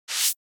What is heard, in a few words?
Open, Nokia E75